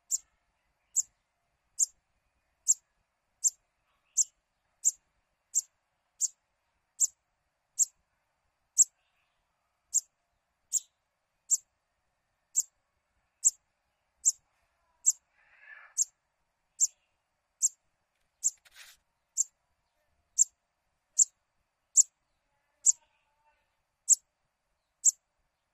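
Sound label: sound of baby bird calling; Tieng chim con keu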